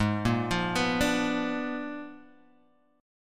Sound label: A sharp 7th Flat 9th G sharp